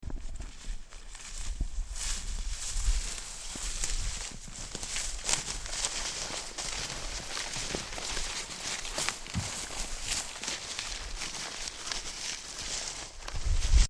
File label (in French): marcher dans le bois